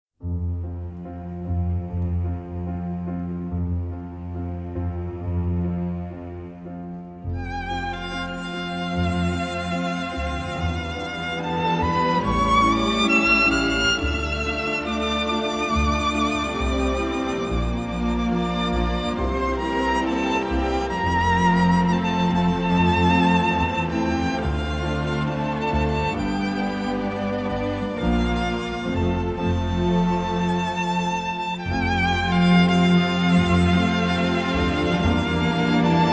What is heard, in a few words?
Instrumental